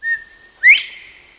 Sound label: Bird; 特酷声音素材库